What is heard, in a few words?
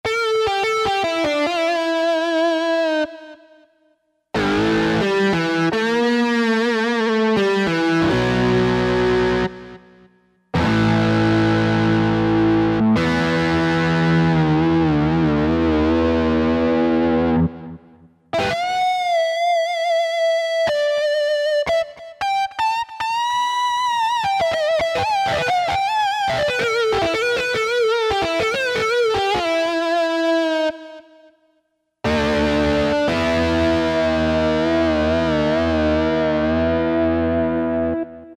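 mild od, guitar; Factory Patches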